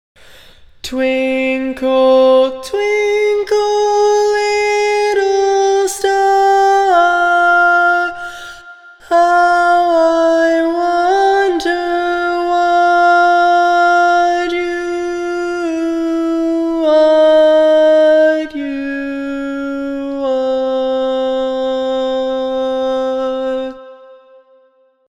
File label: Lead